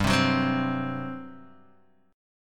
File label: G Major 7th Suspended 4th, G, down, Guitar, Open D